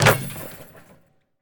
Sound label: Street Ball Dunk Chain; SFX Kit